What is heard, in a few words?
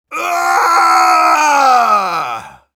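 Engineer pain critical death, ru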